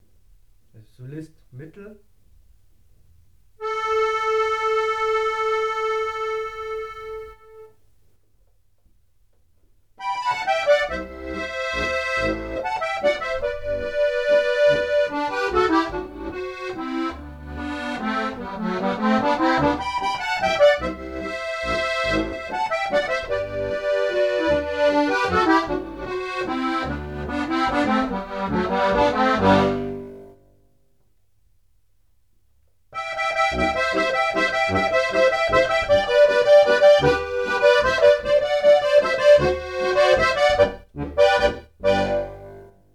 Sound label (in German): Tremolos, Solist mittel